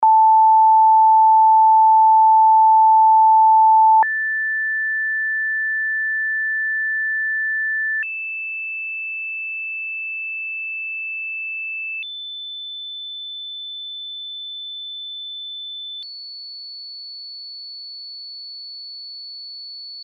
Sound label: Single Notes